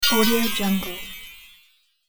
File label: sparkling diamond game